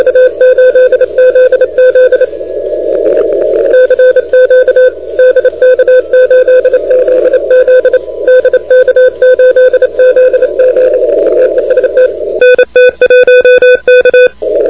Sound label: CW 28MHz; DK8ZZ de NT1K; ARRL10M